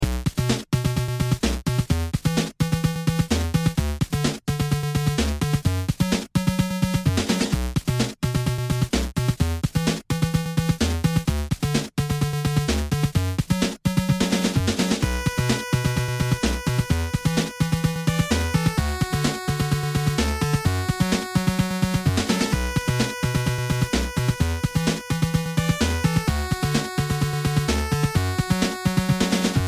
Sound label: beat